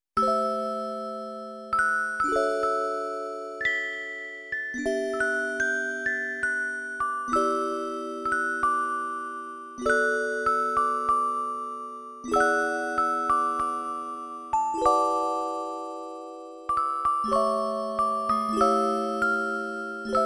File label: musicbox; My Merry May